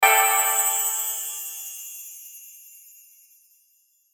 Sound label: Pixie Dust Stab Harp Chimes; Magic